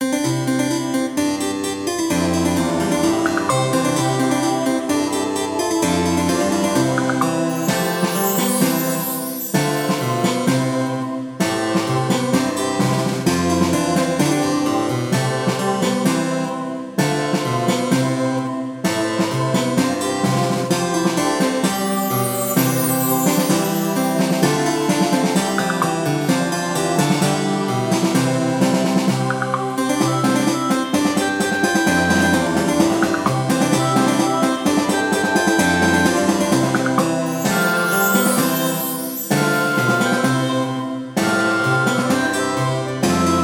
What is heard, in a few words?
humming bright and energetic music